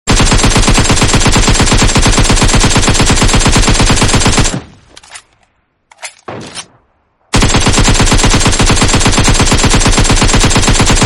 gun sound

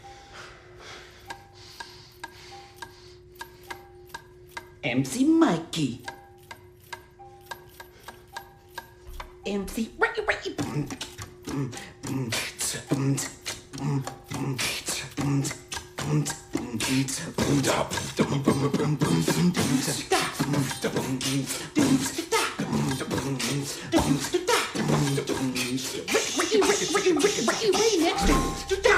Elevator Rap

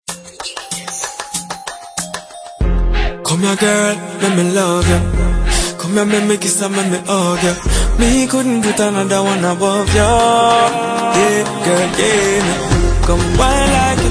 bassline riddim

